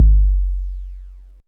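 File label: bass drum 808 SP1200 F#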